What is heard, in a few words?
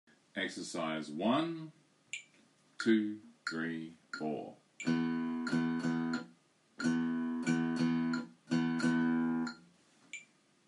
Rhythmic; Grade 3